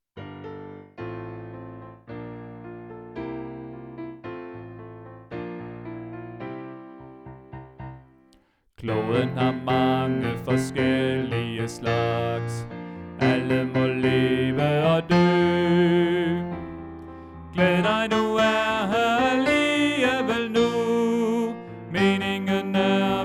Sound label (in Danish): Bas